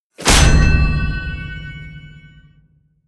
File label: wham, whooshes